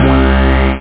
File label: syn lead; 8,363 sample rate; Transcription: Why?